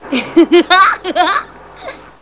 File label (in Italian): risata; simpson